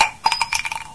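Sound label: shotgun shell, weapons; video game; source engine; hl1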